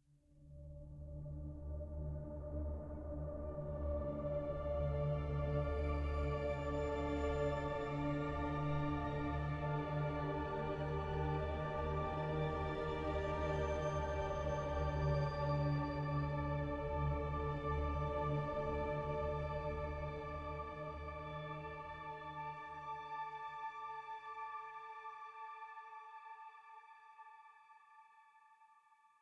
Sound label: Music Entering; One Shot